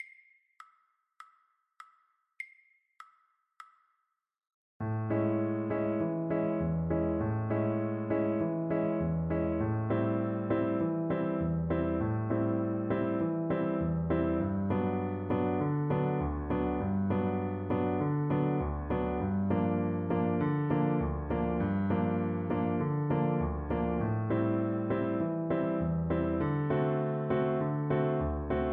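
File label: VLC karaoke, Cello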